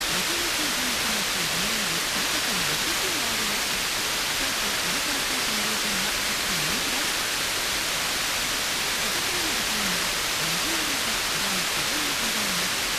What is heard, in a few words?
89.4MHz; CEB1-STATION